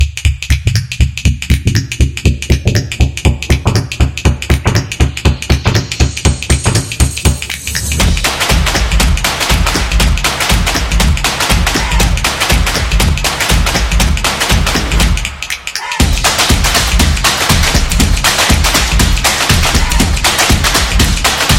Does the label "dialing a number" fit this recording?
No